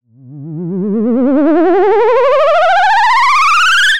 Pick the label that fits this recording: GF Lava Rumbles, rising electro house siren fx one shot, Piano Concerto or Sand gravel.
rising electro house siren fx one shot